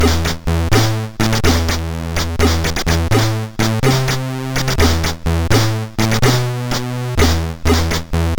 Test sid MOS6581R2; Stupid

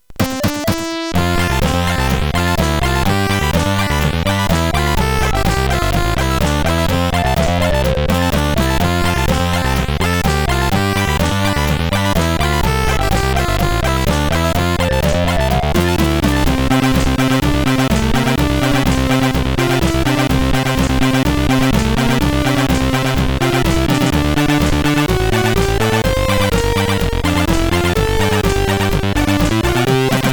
Tecnetium, CSG8580R5; hvsc

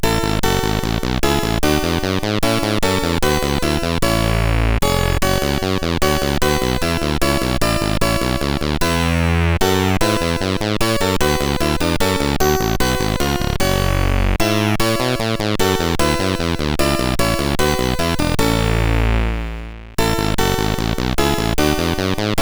Bach CSG8580R5

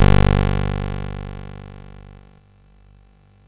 Frantic, MOS6581R4

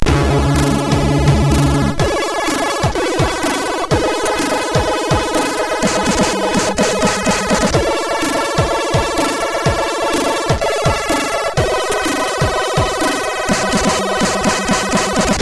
Hawkeye loader, MOS6581R4

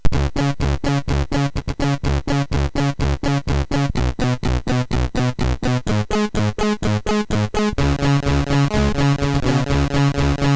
Boing, MOS6581R3; hvsc

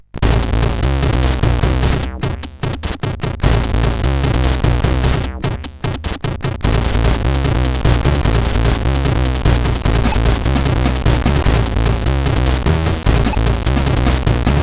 Kick, sid; CSG8580R5

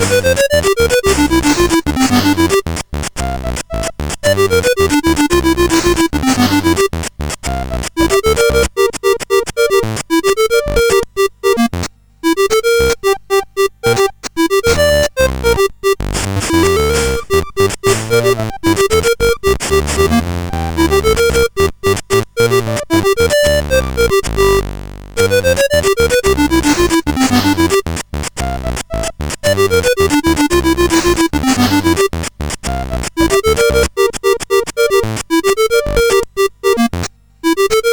Bebop, CSG8580R5

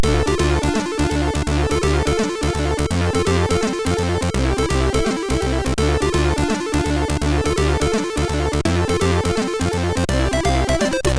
Intro, MOS6581R3; SCS TRC

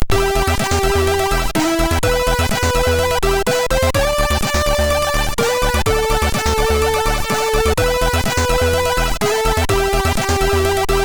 C64 DTV Menu, MOS6581R3

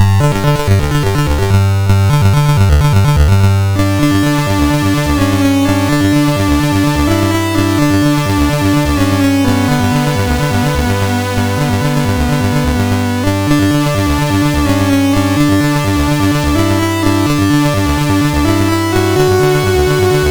First Sound, sid MOS6581R3

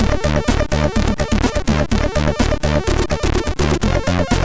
Short House, MOS6581R3; hvsc

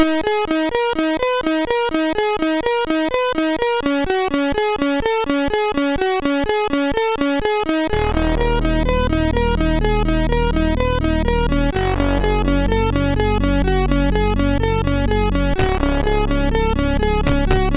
intro, sid MOS6581R4; Eternity 1

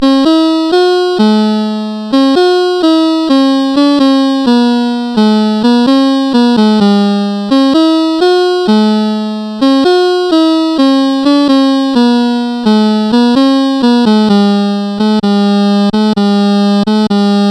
melody, sid CSG8580R5

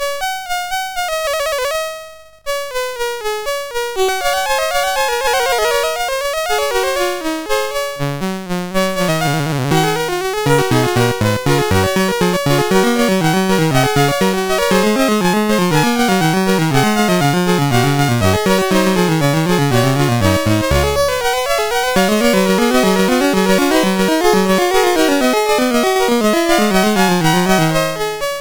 Fugue No 2, MOS6581R2; Fugue No 13

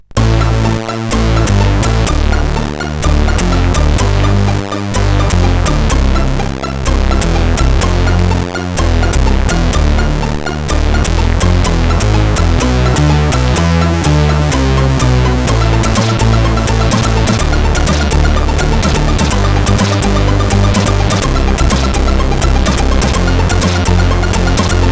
tune, CSG8580R5

